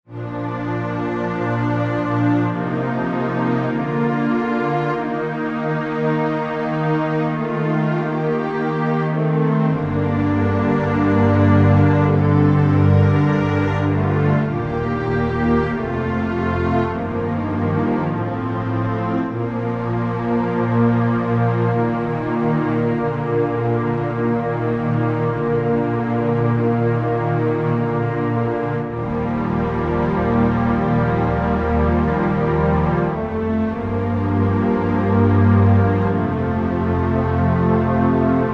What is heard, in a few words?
Underscore PAD; Worship Flow